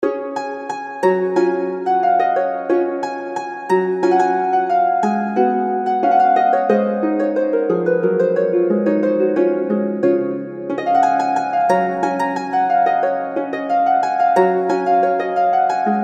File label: celtic harp; dowland puffe